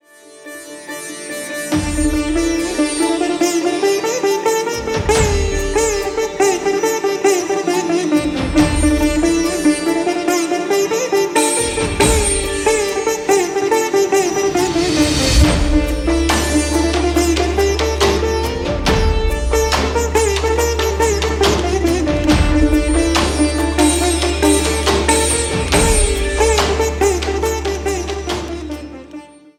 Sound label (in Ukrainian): Індійська музика небес